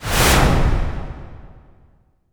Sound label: IMP WIPE, Cinematic Impact